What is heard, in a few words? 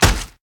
Weapon Stick Dull Attack; Inotia 3